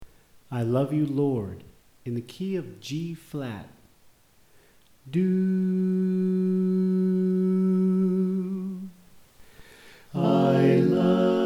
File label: Soprano Left